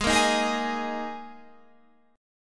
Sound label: A sharp 7th Suspended 4th G sharp, Guitar